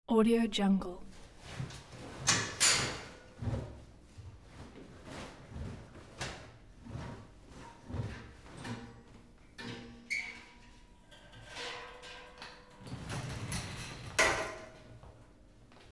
emptying washing machine; sound ideas

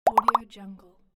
bubbles

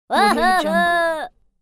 cartoon boy disappoint shout

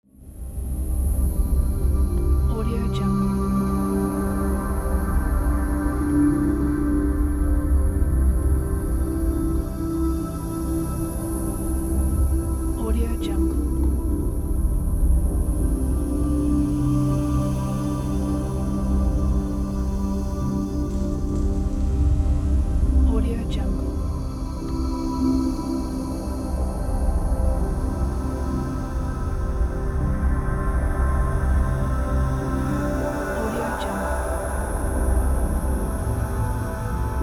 mysterious space ambience

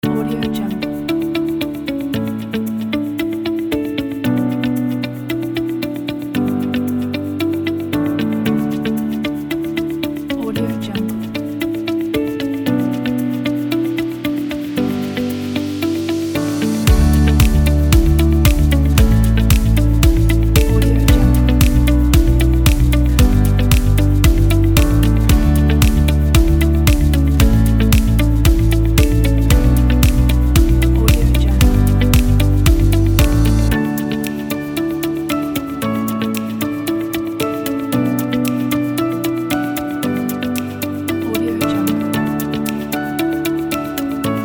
inspirational upbeat corporate music

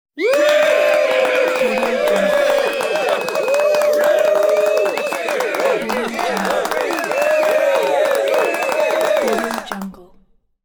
male small group clap cheer

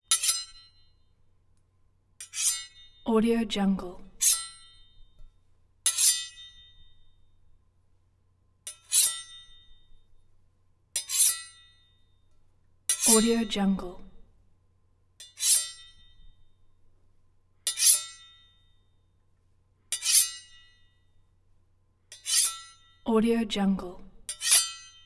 knife blade

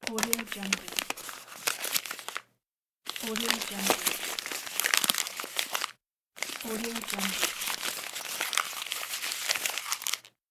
crumple paper

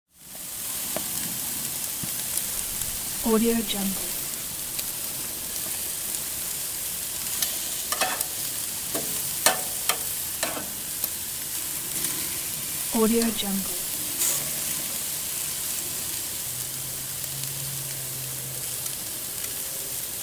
grilling outside; applehillstudios

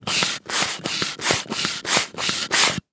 air pump; prosoundfx

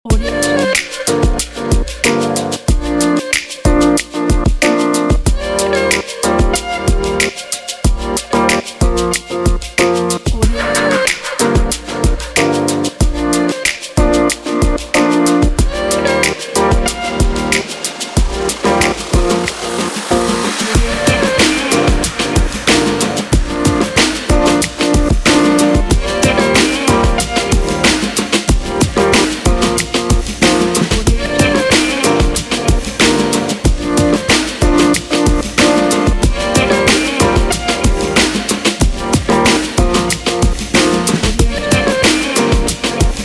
an upbeat hip hop

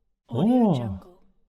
surprised

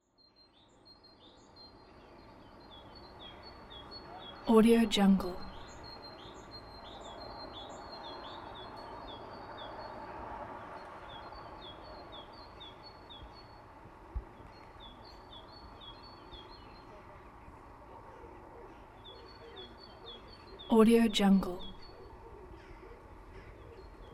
city morning ambience; greenwaysound

